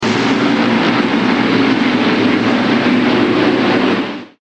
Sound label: RACECAR